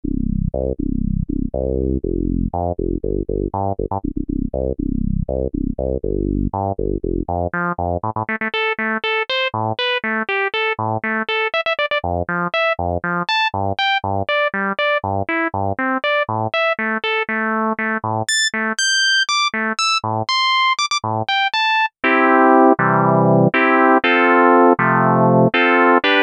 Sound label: ensoniq fizmo filter tran rez bass